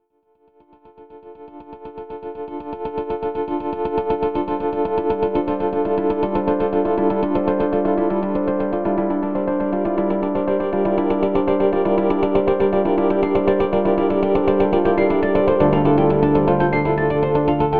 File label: Wurli Minimal; Arturia